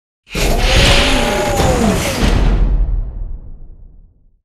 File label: bridge drop exterior; Titanfall 2